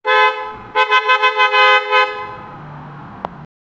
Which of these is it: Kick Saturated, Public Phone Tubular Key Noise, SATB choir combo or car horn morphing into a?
car horn morphing into a